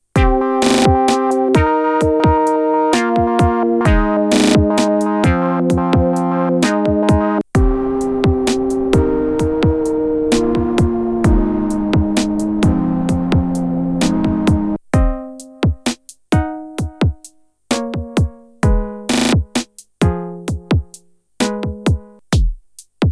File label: Polyend Tracker VAP Engine